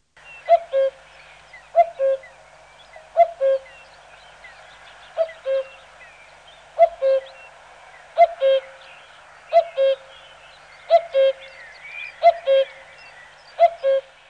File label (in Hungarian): Kakukk; Madarak